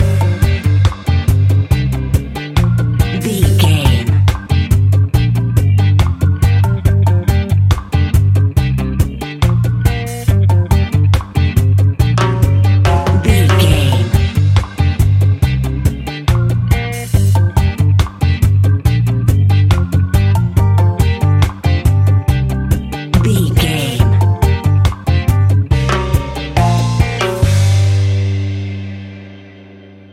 reggae roots music, Classic reggae music with that skank bounce reggae feeling.